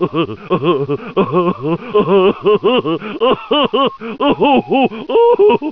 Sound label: BLAUGH